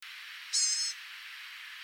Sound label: Blue gray gnatcatcher